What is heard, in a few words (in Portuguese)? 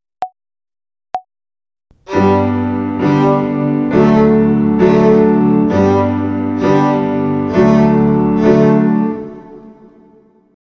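Ginástica para o dedo 1 - Corda SOL - Binária, Violino; Violino para os Pequeninos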